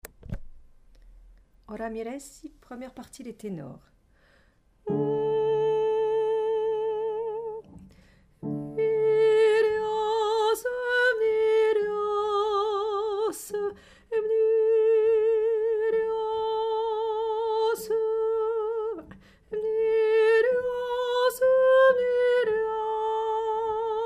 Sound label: Tenor